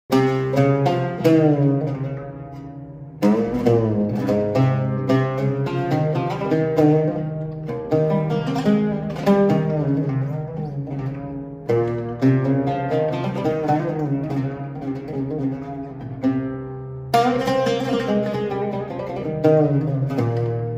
Oud; ساز عود

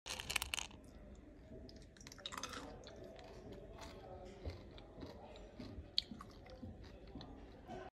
Eating Smarties; اسمر خوردن اسمارتیز

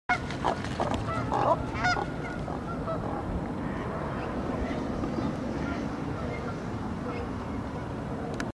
Cormorants

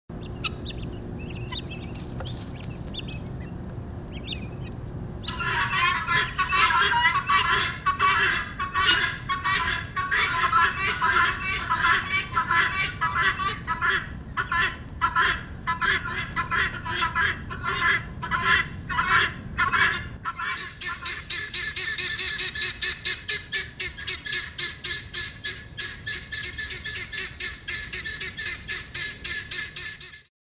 Guineafowls

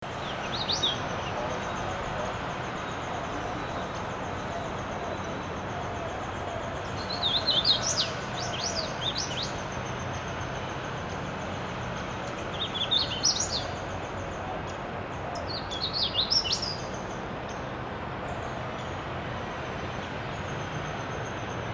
Wilsonia citrina, پرنده سسک روپوشدار

